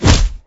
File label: Combat Brawl Kick Hit